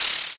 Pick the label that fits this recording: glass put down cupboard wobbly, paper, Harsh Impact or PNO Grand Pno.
paper